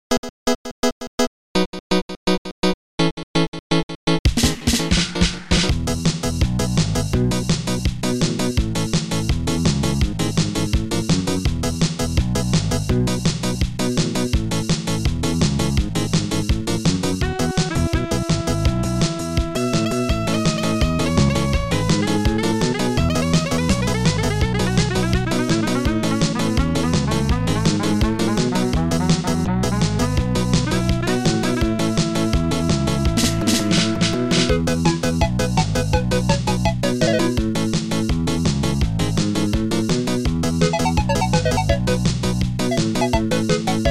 tropical ska

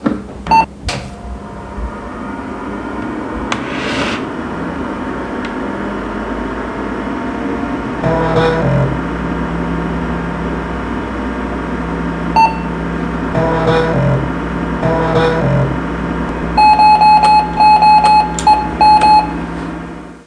boot

